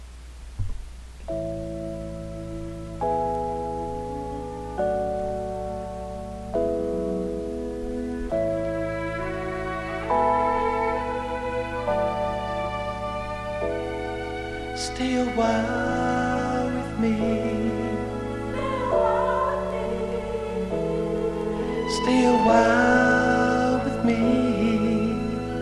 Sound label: shaker